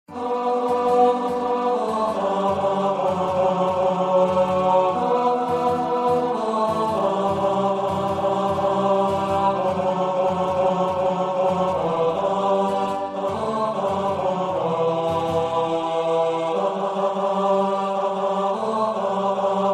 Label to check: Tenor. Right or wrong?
Right